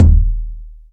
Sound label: rich low end kick d sharp key; kick drum samples; one shots